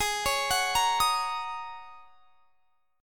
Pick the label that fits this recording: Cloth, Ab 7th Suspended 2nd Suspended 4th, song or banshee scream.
Ab 7th Suspended 2nd Suspended 4th